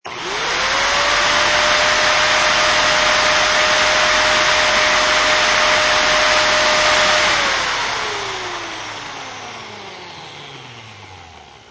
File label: Orbital Sander Long, Orbital sander, start, run for a while, shut off and slowly come to a stop.; Also good as ceramic drill sound; Sander Sound Effects; LynneMusic